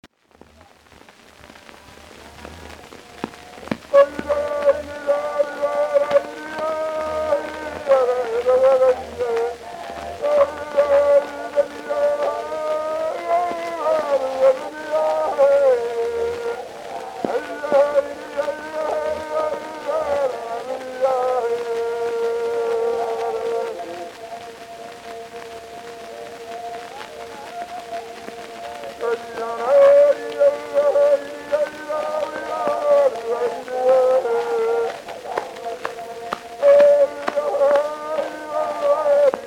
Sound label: Tahitian field recordings, Chorus; Edison Blue Amberol; Himene Chorus; UCSB Cylinder Audio Archive